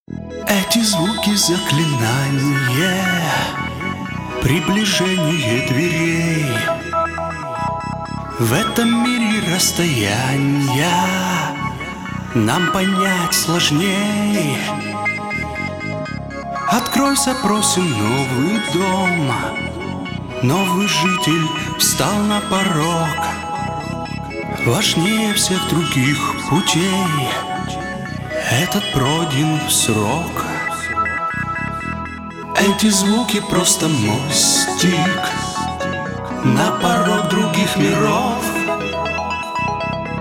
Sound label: rock